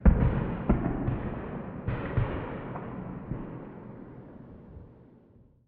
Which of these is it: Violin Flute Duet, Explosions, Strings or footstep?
Explosions